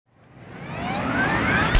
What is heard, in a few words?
minigun start close; buraq